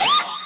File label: scream out